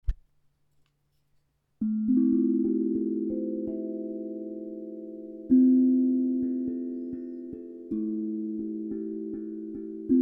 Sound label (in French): Baguettes